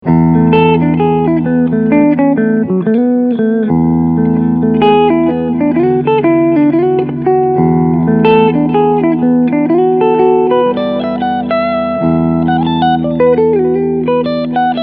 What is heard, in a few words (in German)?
Beide HB Clean Picking